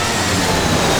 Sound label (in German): Ueberflug unbewertet, flugzeuge; akustik